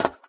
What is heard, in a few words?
metal step; Golden Crayon Sounds